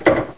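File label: ammo pickup; Hexen2; weapons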